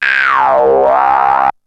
ANALOG, SFX; Syntec; Wall of Sounds; TECHNO-BOX

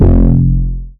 Bass F, Bass Synth

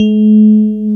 KEY EP, EP; EP + E.Grand

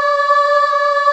CHOR C4; S900

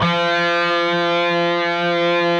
PRS F#, Guitars, LEAD

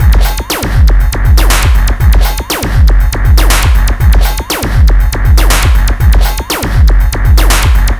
pip off 120bpm, Downbeat Dance Grooves

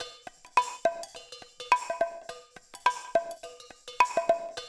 percussion loop

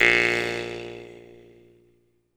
Jaw Harps; E-MU Producer Series Vol. 7 – Old World Instruments

